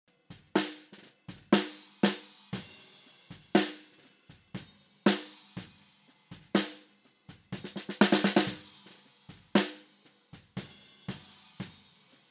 Snare Down

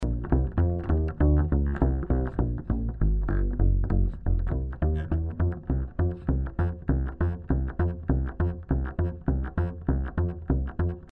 Upright Bass DI

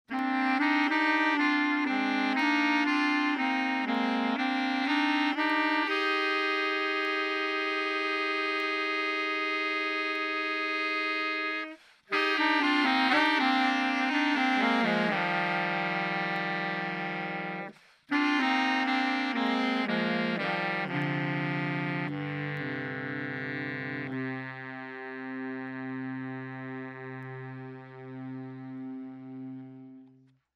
Sax Group

